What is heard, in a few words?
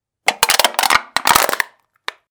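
jars sound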